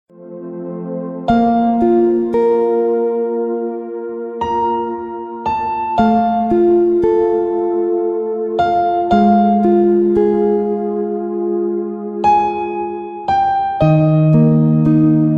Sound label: meditation music ringtone